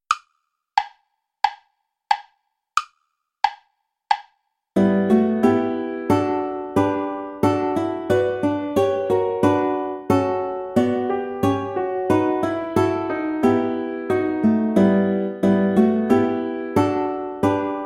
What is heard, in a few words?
play along without guitar; bach johann sebastian; our saviour christ jordan came